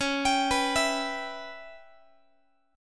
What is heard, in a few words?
Db Minor 7th Flat 5th Db down Guitar; arpeggiated; x,21,22,21,20,x; 4A,4E,5A,5D,5G#,6C#